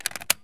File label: akm mag in metal; Escape from Tarkov